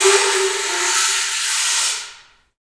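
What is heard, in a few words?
wind bull, Africa, Afro horns, bullhorn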